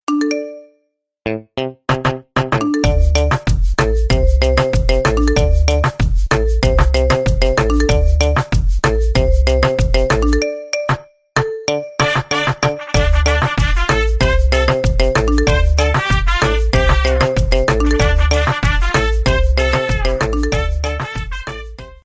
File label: Text Tone Remix